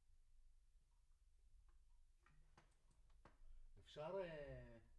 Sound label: Room